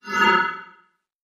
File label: Magic Portal